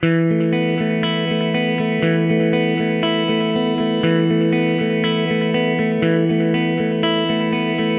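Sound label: Guitar Alternative E minor 120 bpm; Guitar and Bass; Ableton; Factory Packs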